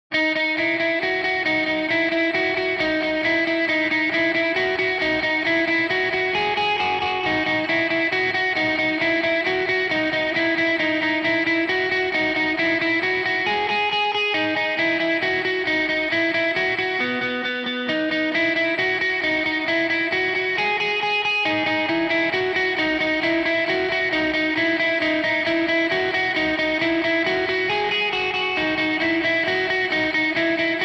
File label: b gtr